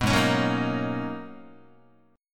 G sharp Minor 11th G sharp Guitar, chord; G#m11 strummed; JGuitar

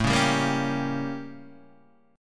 A 7th Flat 9th Guitar Open D

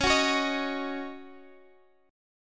D sharp Minor 7th C sharp

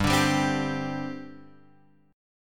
G Suspended 4th, Guitar, Open F; Gsus4 strummed; JGuitar

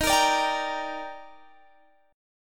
Eb Major 7th, Guitar Drop D; x,18,20,19,20,18; down

